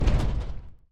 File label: block Break; Mindustry